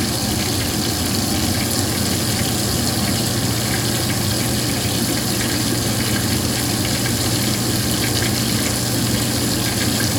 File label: Laundry Machine Run